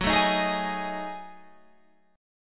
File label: F sharp Minor 6th Add 9th, Guitar Open F; F#m6add9 strummed; 18,16,15,15,18,15; chord shape